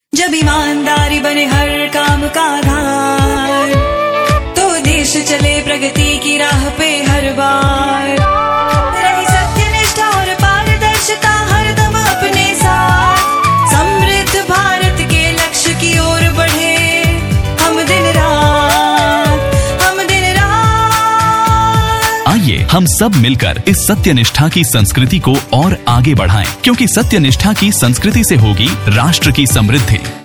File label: jingles